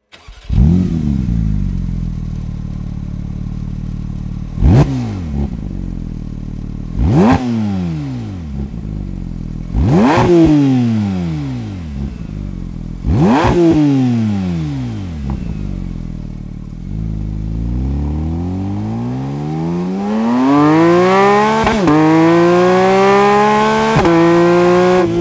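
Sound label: SLIP ON RACE LINE PORSCHE GT3 992, exhaust; akrapovic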